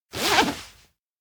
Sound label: zipper down; anemoi